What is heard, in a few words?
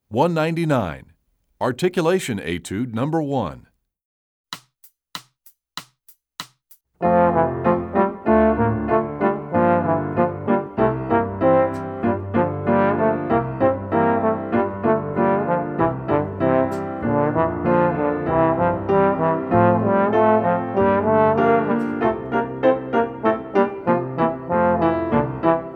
Articulation Etude, trombone; essential elements